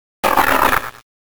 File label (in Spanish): Grito de Mawile